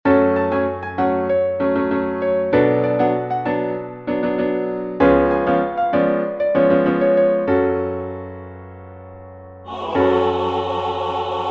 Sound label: MIDI SATB Lead